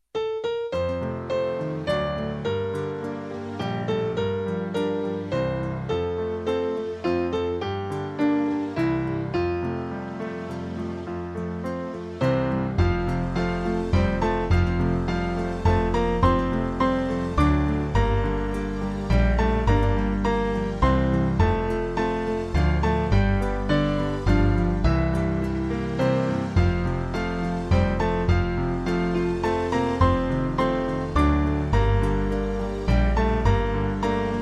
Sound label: small band; Away In A Manger